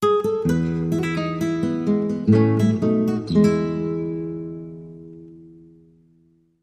Guitar Classical Bridge

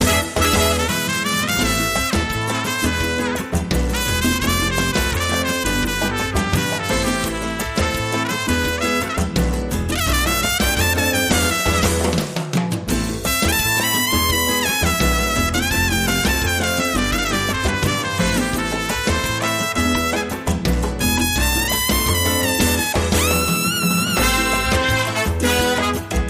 Sultry Rhythms

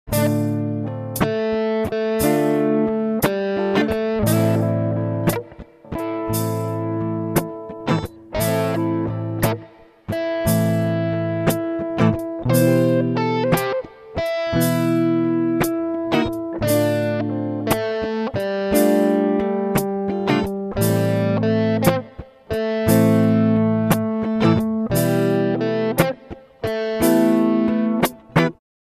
Riff Blues

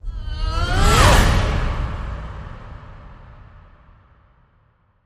Vocal Fury Woman King Flyby

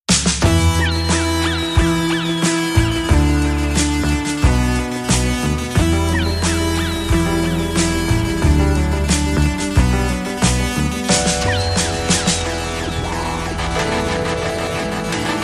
Metal

